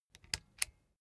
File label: bicycle street gear control down